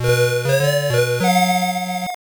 Miss (Trap); Pitfall II Lost Caverns; System 1; arcade

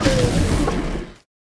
unhide laser

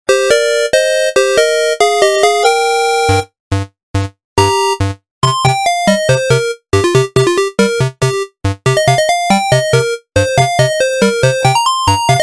Ringtone; Undertale II Revenge of the Robots 2021

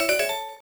Reward; pokemon mystery dungeon explorers of sky

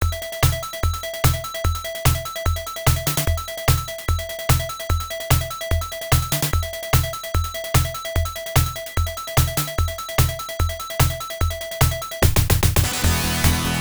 Goal; RoadBlasters; Atari System 1; arcade

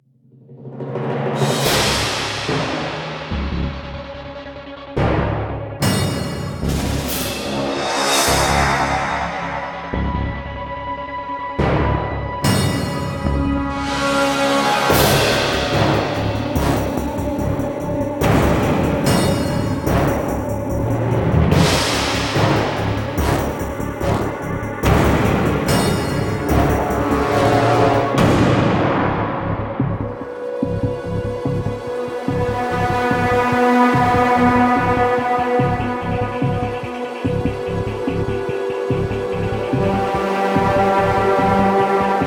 Combat Dogfight; Elite Dangerous

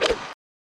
Iron Shot

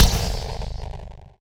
Damage; Puzzle Chronicles; PS3; PSP; X360; SFX SPELLS

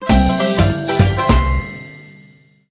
Whale Death; Jetpack Joyride; Android; PS Vita; PS3; PS4; PSP

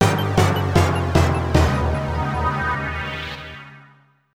Jingle Game Over; StreetPass Mii Plaza; StreetPass Squad

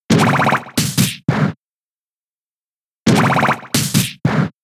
Bullet Seed 2hits; Pokemon; gen 6; XY; ORAS

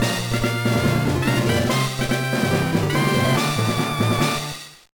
Fanfare Badge Get; Pokemon LeafGreen; gba

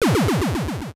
Punching Title Name; Mike Tyson's Punch-Out!!

